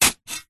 industrial shovel space metal single dig into gravel stones; zapsplat